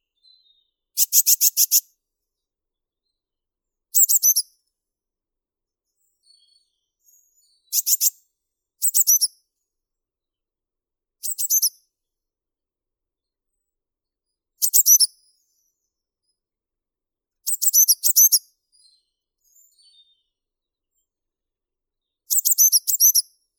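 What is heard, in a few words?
blue tit, Cyanistes caeruleus; lazorevka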